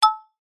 multimedia game sound slot machine single mallet chime